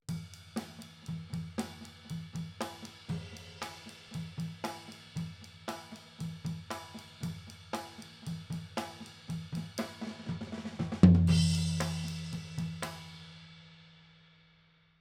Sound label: Toms